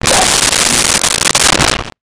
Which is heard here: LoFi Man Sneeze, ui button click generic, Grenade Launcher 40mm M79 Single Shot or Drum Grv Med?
LoFi Man Sneeze